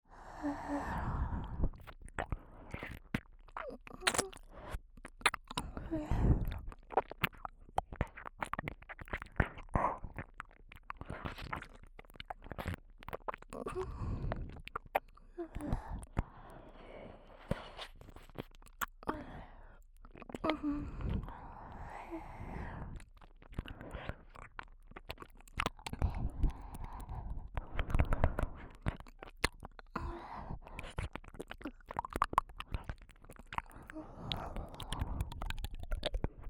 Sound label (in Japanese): 本編使用耳舐め素材; (右)